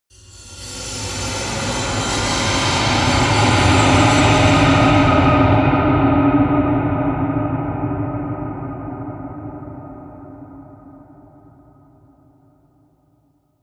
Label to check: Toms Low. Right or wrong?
Wrong